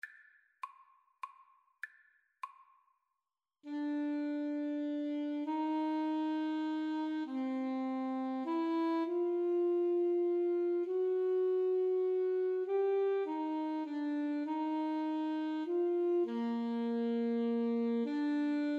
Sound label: clarinet saxophone duet